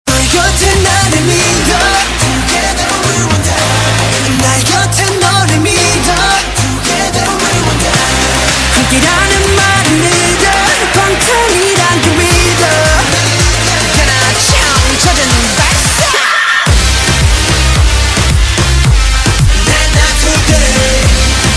korean ringtone

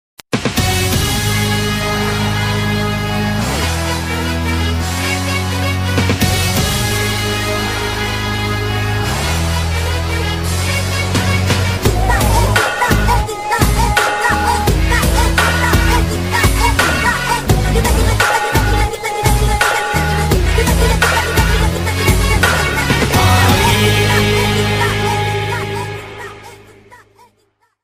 BGM tamil ringtone; Maari

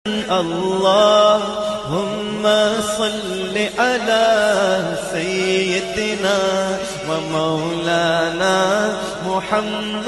islamic ringtone; Allahhumma salli ala

